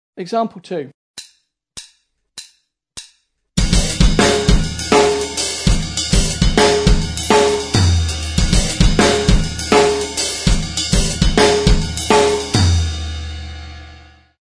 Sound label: drum set